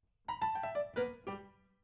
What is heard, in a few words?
PIANO, RNR for piano